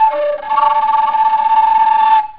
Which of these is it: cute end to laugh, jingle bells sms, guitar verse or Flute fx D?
Flute fx D